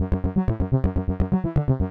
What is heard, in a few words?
acid 303 squelch key f